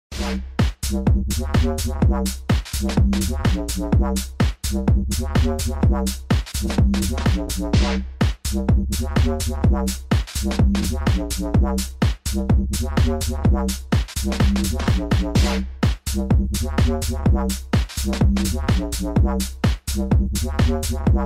Climb; Color Switch

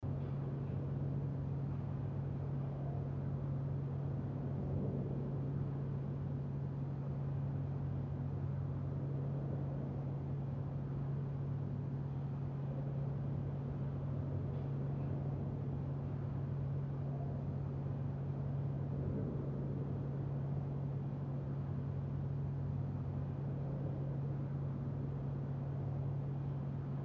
Venue Ambience

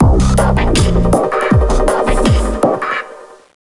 Country Crash

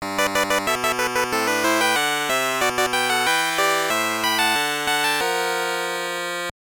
Jingle, soundtrack; card game 1990 gb

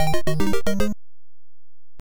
Duck Dead

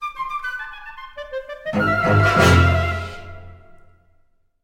Sting; Courtyard; Ratatouille; PS3; Xbox 360

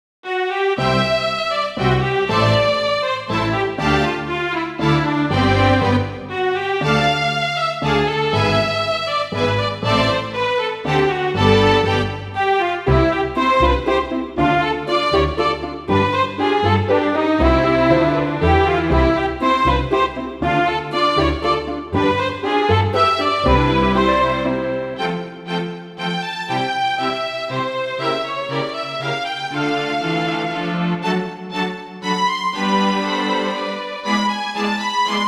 waltz; The Legend of Heroes III White Witch

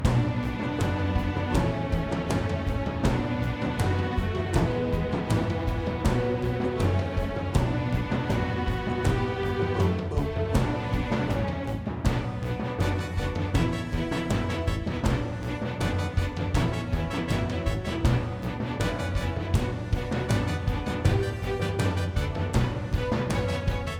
Dreadwood Boss B 160 Med

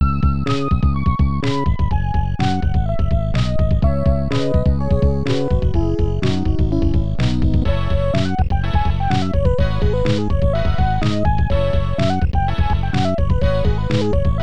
Level Complete; qix amiga

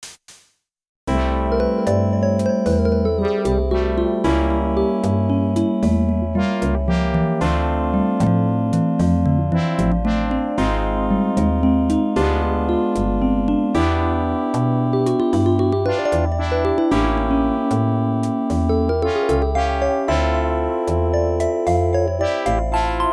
Game Over Music; Galaga '88

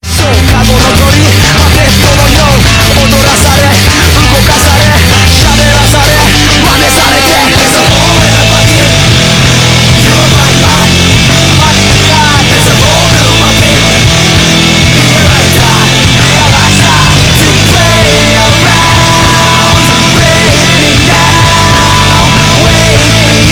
Karaoke BGM; Minigame

